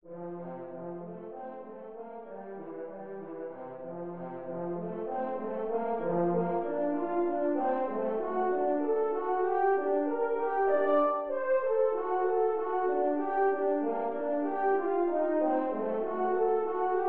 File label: Horn Track